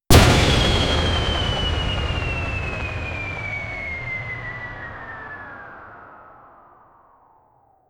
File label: short soft missile launch; explosive tail